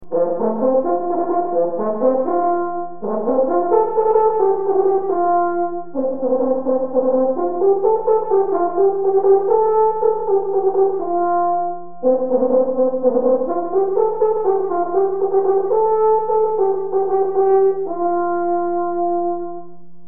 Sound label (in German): Hornruf